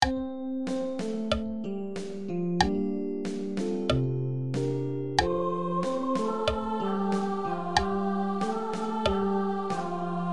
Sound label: gloria Soprano Slow; paulusfest